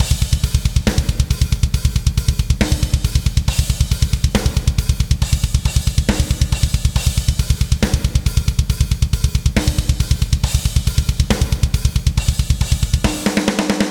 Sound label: Drums and Jingles Exploration Drums